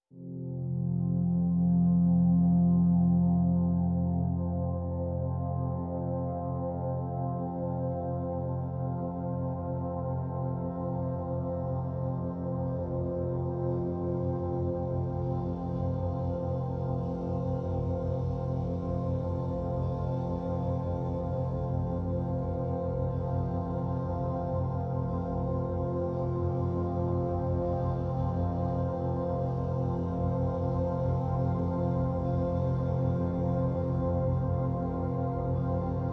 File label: Pads Absolution (F)